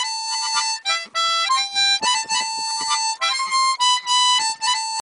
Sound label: Harmonica